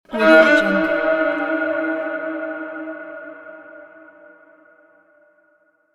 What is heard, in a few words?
deep horror violin transition